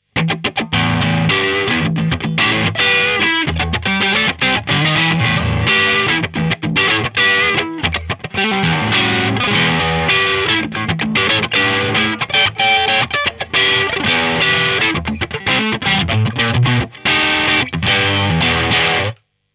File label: JCA 20 Funky Crunch